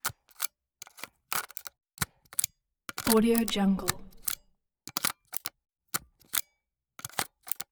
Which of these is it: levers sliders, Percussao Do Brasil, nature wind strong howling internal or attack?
levers sliders